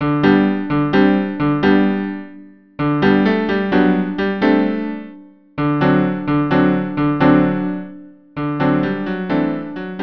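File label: Hat Dance cello piano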